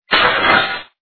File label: vase